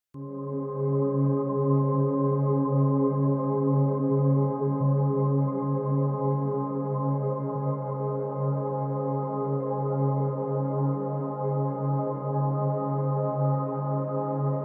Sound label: shop pad